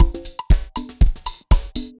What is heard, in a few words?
dancefloor perc 120 bpm